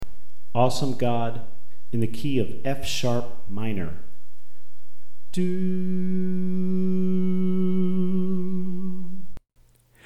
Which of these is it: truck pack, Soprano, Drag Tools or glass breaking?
Soprano